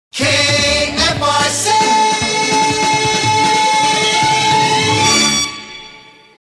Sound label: Sung Jingle; KFRC; TM Series